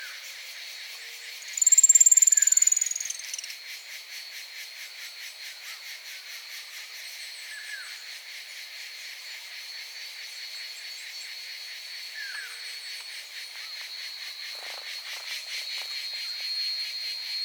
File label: Piculet